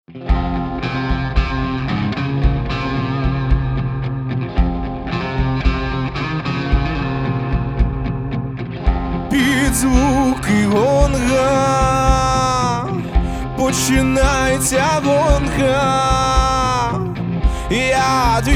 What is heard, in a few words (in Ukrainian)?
pid zvuky gonga